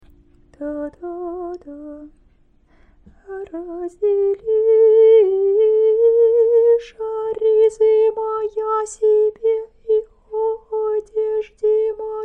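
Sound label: great friday morning prokeimenon g soprano, singing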